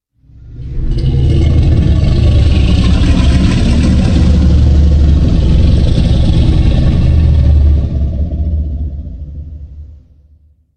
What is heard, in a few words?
Dragon Sound